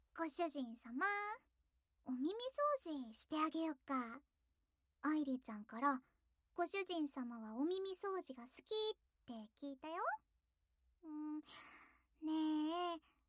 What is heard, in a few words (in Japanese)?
お耳のお掃除; ASMR